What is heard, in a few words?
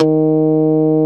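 Guitar Mute G1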